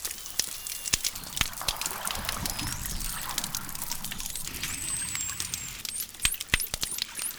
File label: clicks humpback dolphin